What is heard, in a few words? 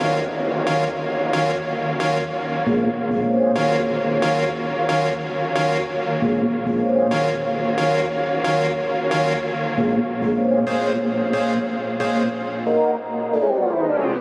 Flow Synth, D-, 135